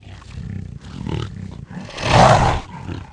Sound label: Idle, Jaldari Mutant, Aliens